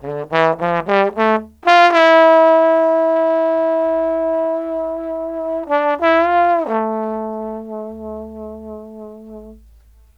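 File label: TROMBONE SOLO, BRASS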